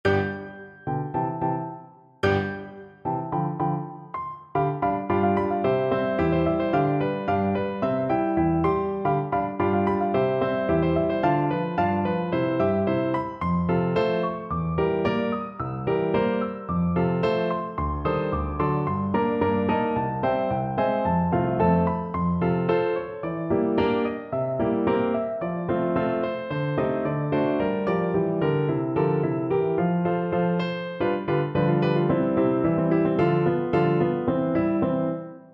pizzicato polka, piano duet

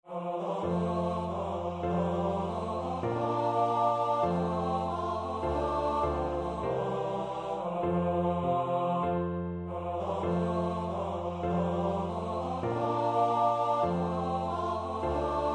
sally gardens, vocal duet

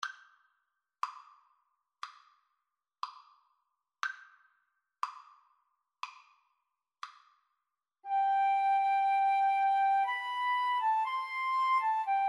romance, Violin Flute Duet

